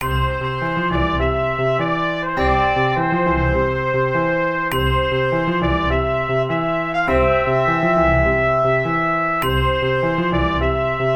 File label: magician village